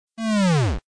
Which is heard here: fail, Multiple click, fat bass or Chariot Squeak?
fail